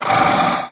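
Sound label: Movement Breath Out; smite; god bakasura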